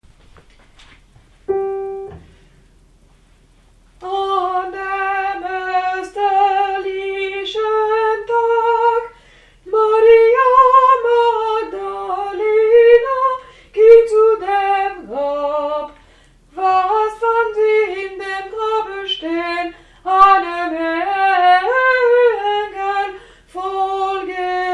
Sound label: magdalena alti